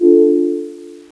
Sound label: open; Quicken